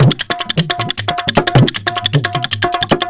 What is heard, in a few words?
DHOLAK, Indian Traditions; USB Soundscan vol.45; AKAI